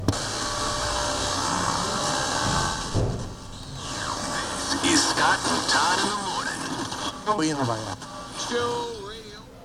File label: Radio Tuning